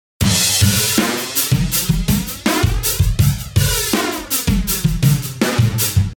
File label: 162 FLANGER LOOP BIG OPEN BEAT WITH CRASH